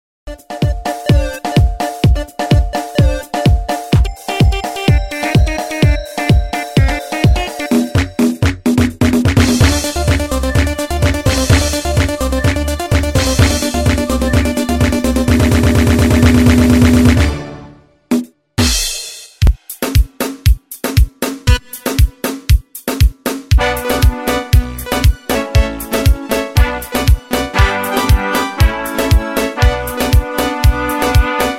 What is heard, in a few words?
techno